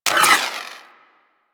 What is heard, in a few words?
Wall Hand Slide